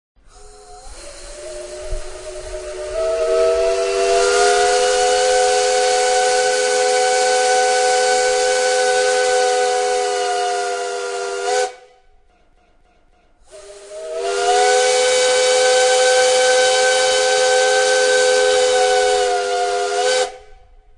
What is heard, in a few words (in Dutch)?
stoomfluit; hercules